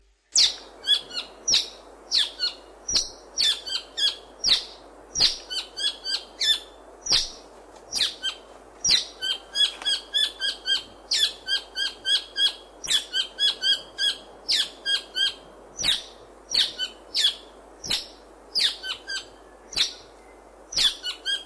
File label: Saddleback South Island